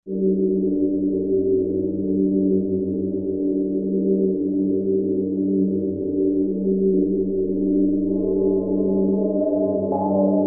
Strange Drones

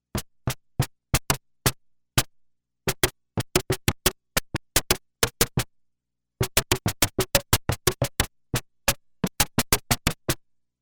Percussion

